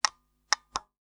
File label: Taxi Meter